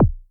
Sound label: BD dance, Electric Kick, Yamaha DTXpress